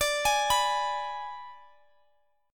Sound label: G sharp Diminished D